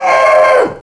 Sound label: lava; player